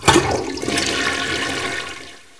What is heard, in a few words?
toilet long